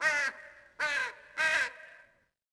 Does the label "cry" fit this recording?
No